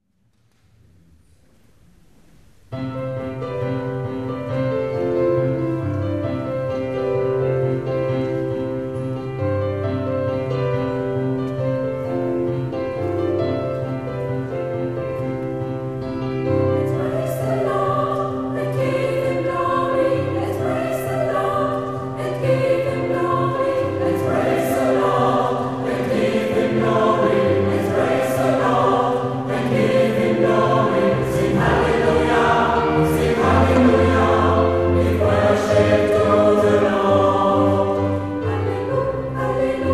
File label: Halleluja